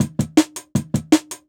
Jungle Breaks; Original Jungle Breaks

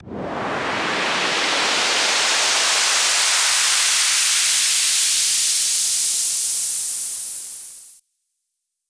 Uplifter; 99Sounds x Monosounds

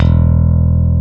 ROCK BS E2, PICK BASS

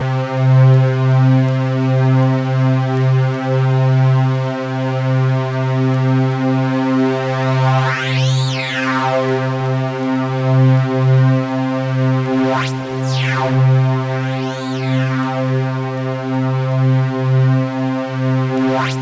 C2 FM, Synth; 90 s Sample CDs; NiceBeats; SynthLine VOL-20

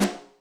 Smacker, Electric Snare, Yamaha DTXpress

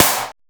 AGGRO SNARE; POP-DNC 2

